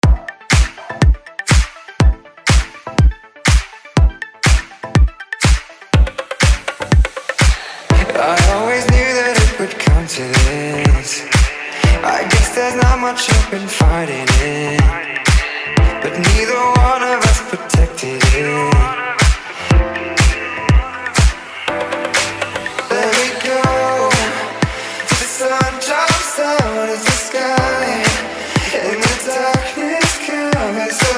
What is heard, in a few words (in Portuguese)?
energy vibe eletronic music